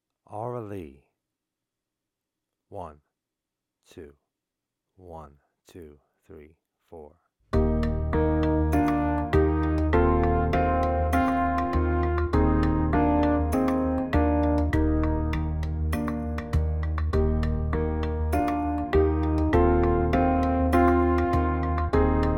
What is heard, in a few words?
play alongs Aura Lee